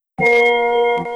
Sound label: Windows Server Startup & Shutdown; Windows Never Released Soundpack 2